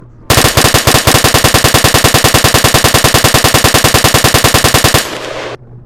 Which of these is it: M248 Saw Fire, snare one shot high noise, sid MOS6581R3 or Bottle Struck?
M248 Saw Fire